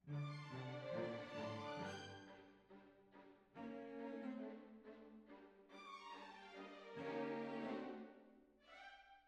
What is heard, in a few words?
Cbasses